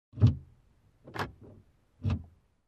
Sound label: Opening and Closing compartment in car